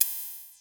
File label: HH Open, Vermona DRM1-MK2 Hi Hat